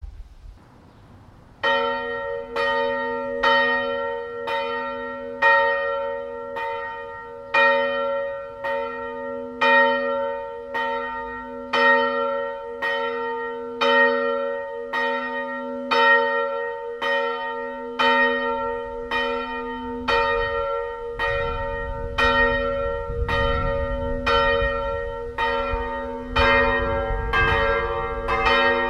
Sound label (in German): glocken